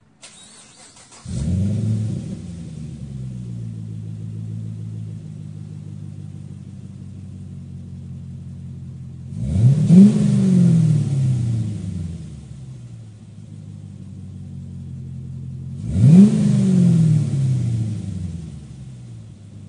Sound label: thrush Welded